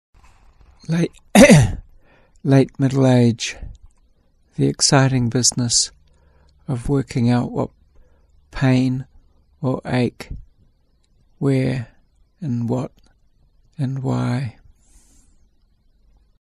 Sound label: middle age ache pain